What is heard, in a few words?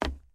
Step Run Wood; SandBox Musics